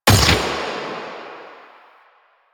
Fire Shot; Duviri; Incarnon Adapters; Incarnon Sybaris Prime; Weapons

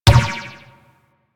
Ball Bounce Default; Warframe; Missions; Tenno Ball

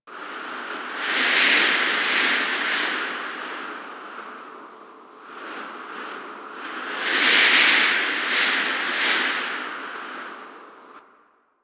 Crp Ship Vent Room Wind Loop A; Ambience; Props; Warframe; Corpus Ship Remaster

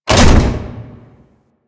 Barrel Drop; Ambience; Warframe; Grineer Galleon

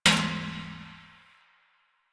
Metal Trash Can Impact

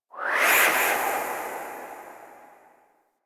Gas City Distant Wind Textures, Ambience; Warframe; Corpus Gas City

